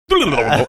making some funk grunge sounds

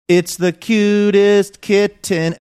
singing it's the cutest kitten

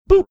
boop

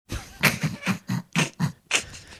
snickering

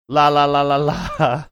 singing la la la la la

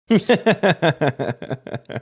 chuckling

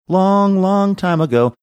singing long long time ago

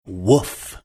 woof

